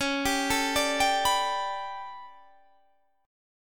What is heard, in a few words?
A 11th Db, guitar chord